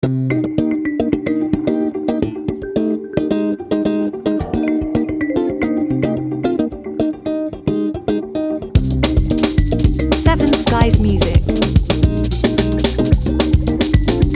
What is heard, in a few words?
Bass Guitar